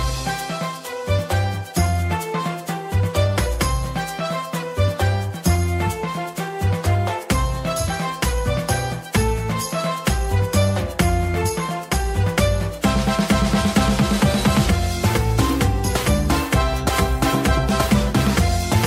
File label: jingle sounds; christian